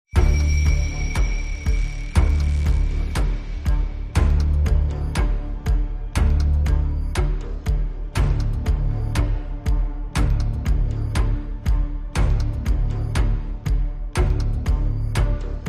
Pulsing Paranoia, Less Guitars

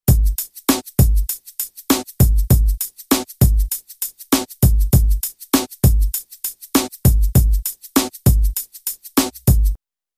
Drum Loop

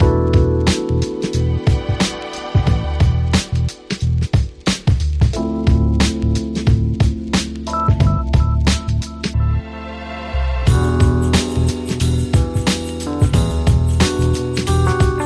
Chill Urban Atmosphere, Underscore

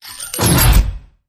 Weapon Shells Whoosh

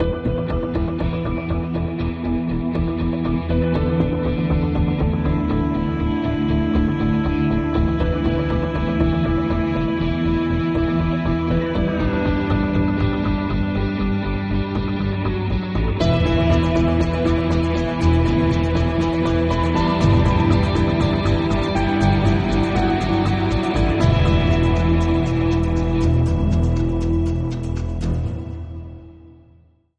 No Perc

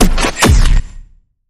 Combat Unit Reload Hit

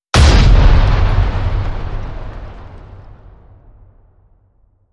Shell Shock Mortar Hit

